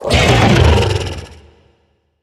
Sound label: beast vocal angry growl